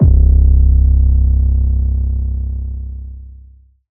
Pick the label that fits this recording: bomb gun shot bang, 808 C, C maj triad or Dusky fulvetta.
808 C